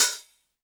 HIHAT HIP; Best Service Dance Mega Drums

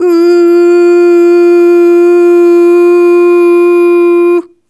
Ooh Long F3 Dry; Harmonic Building Blocks

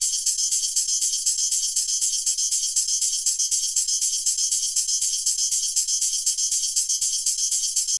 Rhythmic Iron; Electronic Point Blank

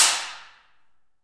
Aluminum Wall, FX Perc; Roland L-CD701

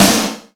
SD, Yamaha RY-10, Drums

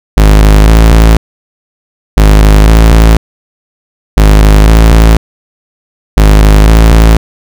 120 Bass; Zero-G; Soundclash Nu Dance Electro; Combi Loops; 120bpm; Agoraphonica

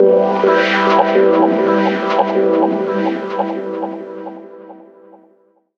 PAD MOIST; Rawcutz; Nu Forms

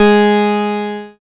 BAS MKS-50, Roland

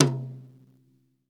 TOM, Brush Kit; Club-50 - Foundations Roland; Brush Kits

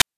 PITCHED NOISE; NES DPCM; MIDINES

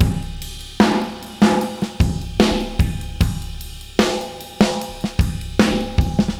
Beat Loops; Zero-G; Monster Beats

